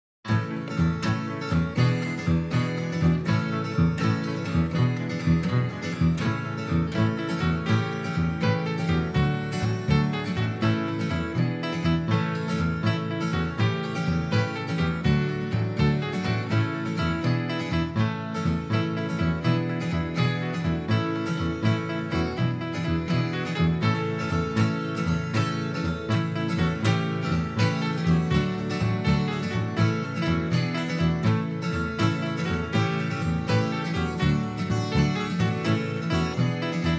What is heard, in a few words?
instrumental